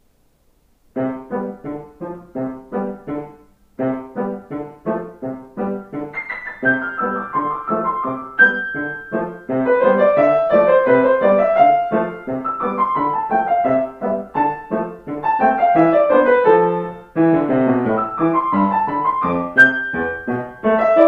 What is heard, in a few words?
Musical Ride fade